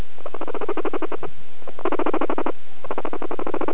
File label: hapurr, guineapigs